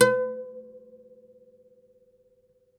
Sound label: OUD B3 -R; E-MU Producer Series Vol. 7 – Old World Instruments